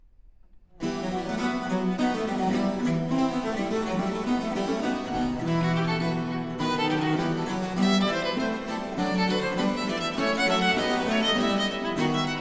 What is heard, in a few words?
Sonata in F Minor for Violin, Allegro; Sonata Sexta